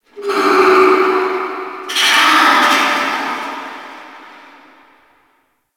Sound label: Ice Critter Distant D, Ambience; Warframe; Corpus Ice Planet